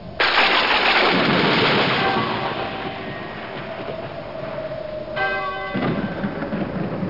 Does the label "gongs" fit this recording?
Yes